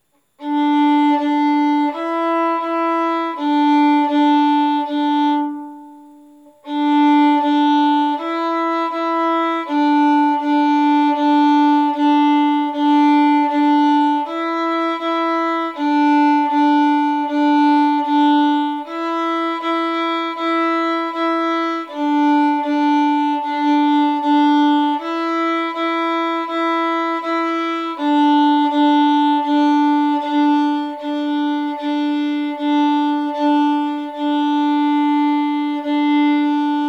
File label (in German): Geige arco